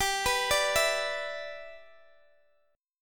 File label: G 6th, Guitar, Open D